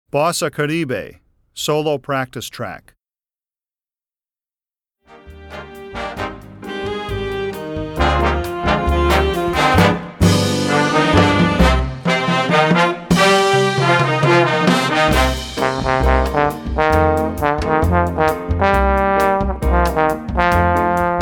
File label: Bossa Caribe practice track